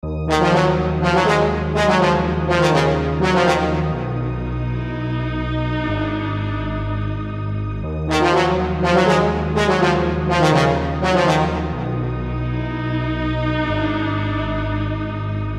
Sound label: 123BPM D#m, Melody Loops; The Elephant Whisperer; Unison Essential Melody Loops